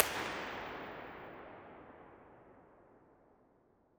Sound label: Cathedral, IR Samples; fleet keys Samples